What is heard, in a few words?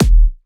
Kick; FLPRO